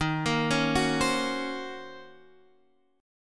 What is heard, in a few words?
Eb Minor 13th Eb down; Ebm13 arpeggiated; JGuitar